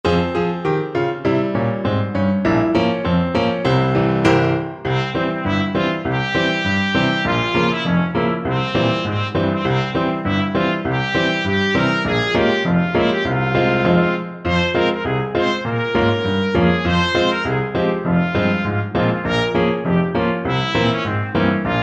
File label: cuando el rey trumpet